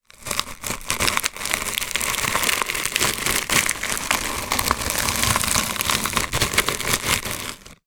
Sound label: plastic object roll scrape over gritty surface; foley; zapsplat